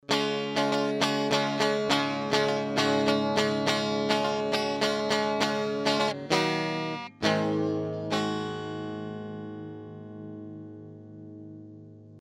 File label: guitar clear